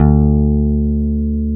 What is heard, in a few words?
FEND P-BASS D2; Sonic Reality - Vintage Timetraveler